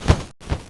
Archangel Move Sound

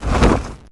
First Aid Tent - Death Sound; Heroes3; War Machine

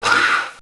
Water Elemental Hurt Sound

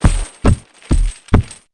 War Mammoth Move Sound

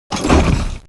Ballista Hurt Sound; Heroes3; War Machine

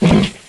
Lizard Warrior Defend Sound; Heroes3

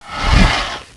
Bone Dragon Defend Sound; Heroes3

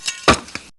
Wood Elf Defend Sound; Grand Elf

